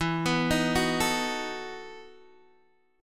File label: E Major 7th Suspended 2nd Suspended 4th, Guitar, DADGAD